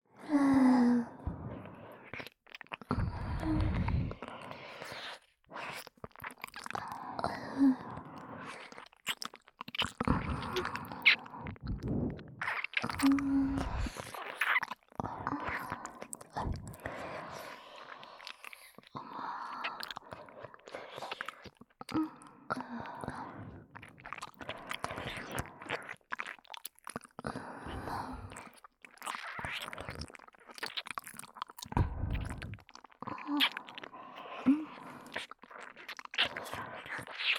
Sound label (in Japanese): 両耳舐めループ, ASMR; 毎日母娘丼〜隣の母娘がエロ過ぎて枯れちゃいそう!～